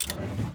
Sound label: click window close